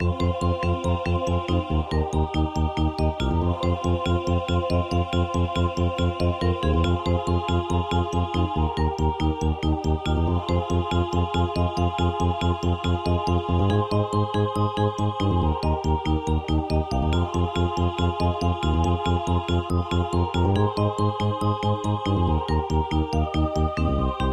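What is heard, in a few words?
background, MIDI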